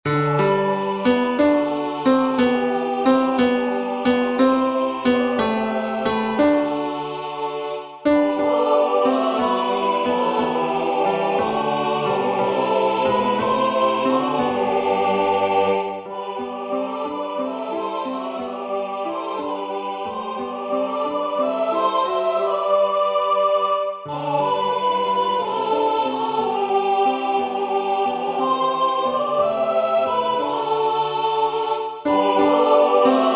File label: Tenors; Kantuz